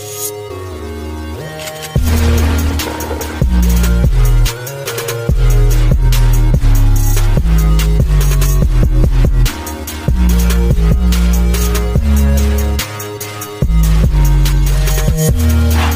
remix; CrackDown

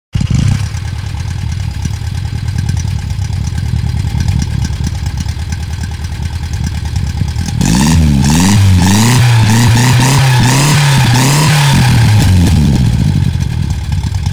Fiat 600

